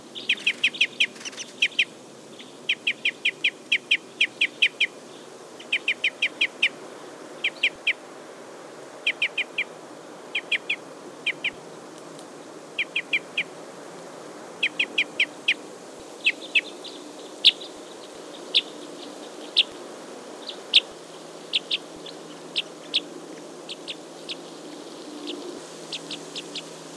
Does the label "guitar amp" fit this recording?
No